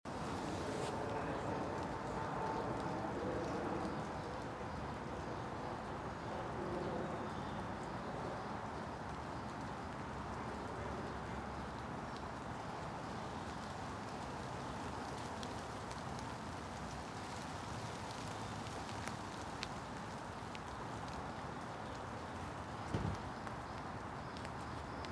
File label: City Rain, Ambience